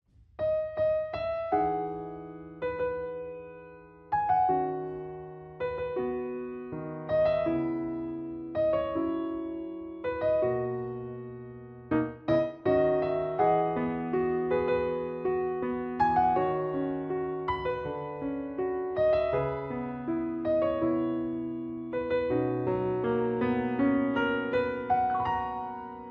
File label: piano